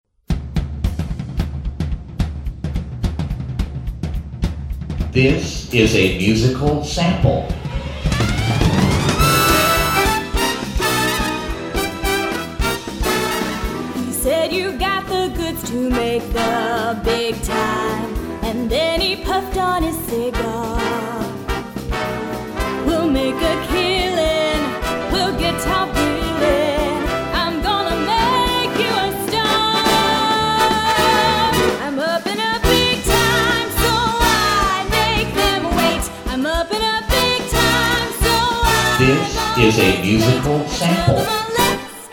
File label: Big Time Jazz; Sound Alike Tracks